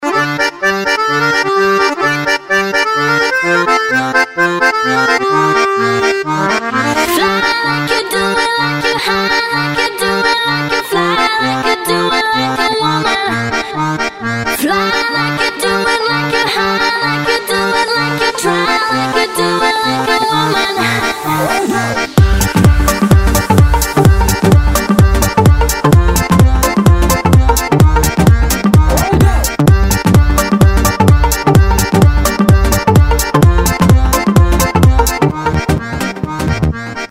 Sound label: accordion; garmoshka; funny